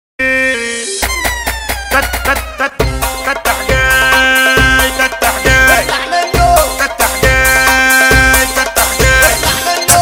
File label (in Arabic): مهرجان; mhrgan